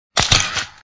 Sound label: camera; reversevape